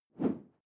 whoosh simple short airy; swooshes-swishes

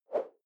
whoosh simple short fast

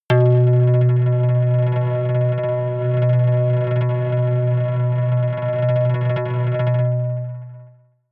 synth bass rattle tremble high, drones

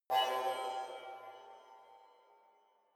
sci-fi facility ai awake damaged severe, signale; soundpool

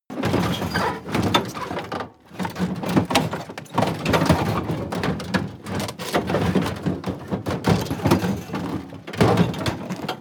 plane cargo area rumble rattle; flugzeuge verkehrsflugzeuge; verkehr transport

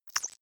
click synthetic light, signale

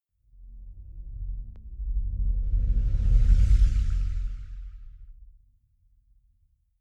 magic spell summon stream

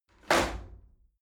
shower slide door open hit heavy

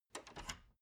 wood door old lock handling short

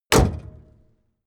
car camaro close hood

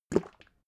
bottle syrup upend glug; berufe; gastronomie

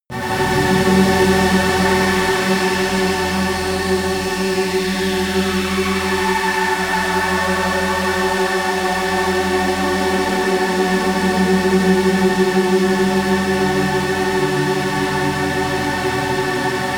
running energy, music elements

